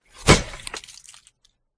chopping trees and wood chop axe tree impact hard forest; silverplatter audio